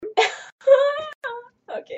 Noise

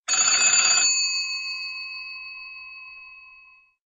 Antique Phone

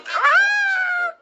scream

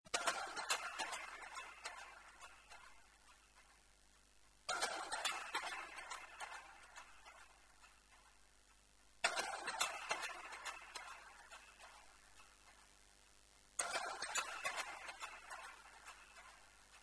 HTC Ring tone

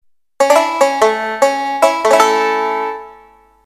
Twang; Usa

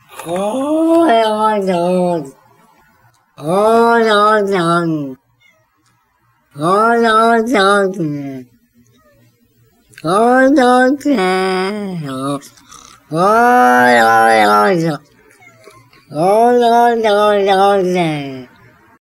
Cat; Oh Long Johnson

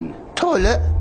Toilet

